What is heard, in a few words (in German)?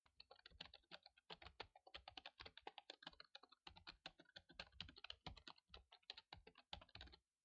Normales Schreiben